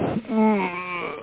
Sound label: puke groan